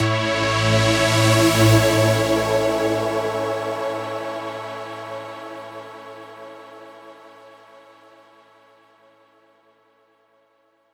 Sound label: Huge Pad Fill In 138 BPM, Bminor; Unlooped